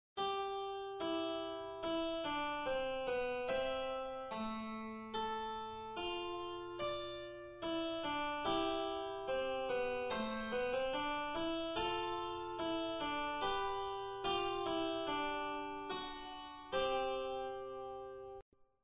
Lo stesso esempio un ottava piu in basso

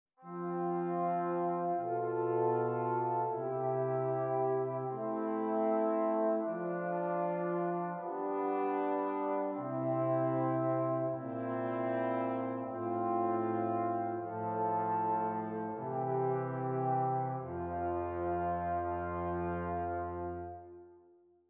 esercizi di contrappunto semplice A tre voci; Ronge

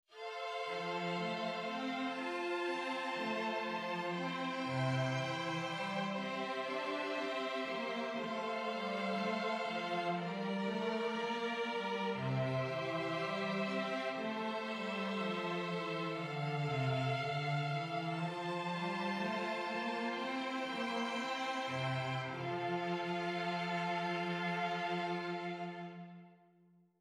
esercizi di contrappunto semplice A quattro voci

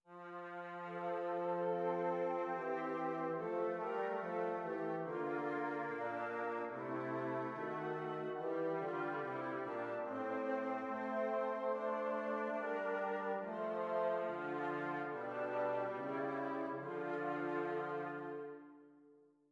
esercizi di doppio contrappunto nella decima; Ronge pagina 172

